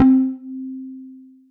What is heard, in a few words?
Sound the Alarm, Tension, Mallets; Instruments; Ableton; Synth Essentials